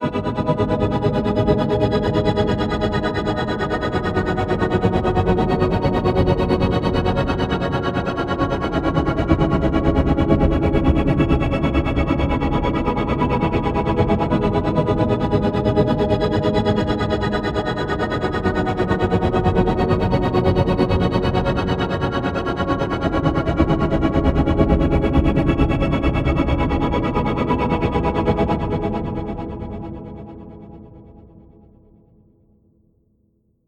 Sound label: Scattered Choir